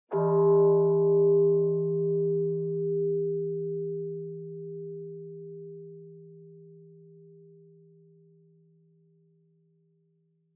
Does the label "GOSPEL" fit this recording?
No